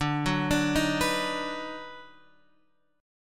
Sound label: D sharp Minor Major 7th Sharp 5th D